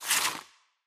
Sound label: winter tile pickup